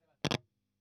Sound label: EL Fuzz End